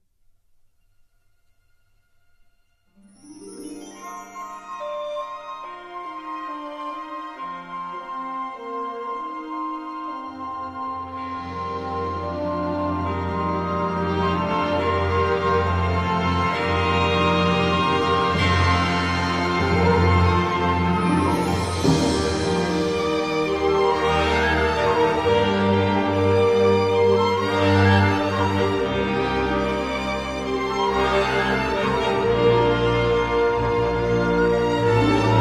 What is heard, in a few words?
orchestra instrumental; kingdomhearts; kingdomorchestra